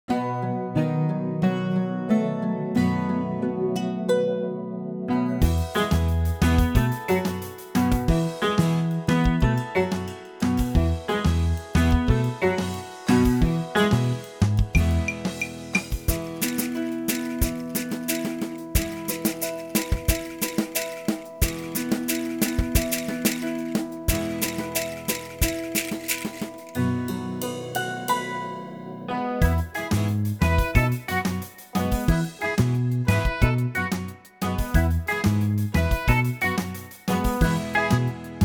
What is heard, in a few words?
get training instrumental; KS2 Music: Ancient Greece; Olympic Games